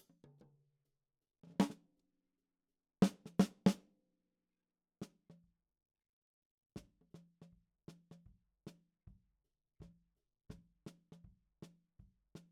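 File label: Snare top, Ragtime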